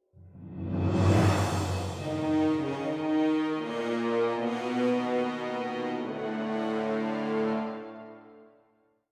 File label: Wind; Unruly Heroes